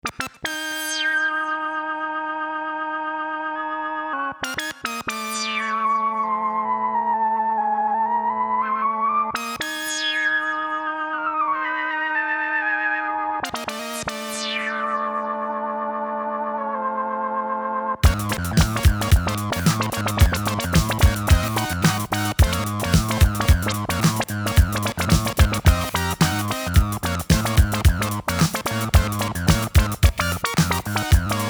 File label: Power Funk; Sunshine Music